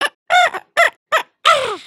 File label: robot dance, emotes